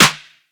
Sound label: snare smack; Hip-Hop; Drums; Drum One Shots; Slate; CAICOS Hip-Hop Sample Pack